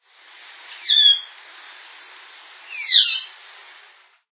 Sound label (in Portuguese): uirapuru-laranja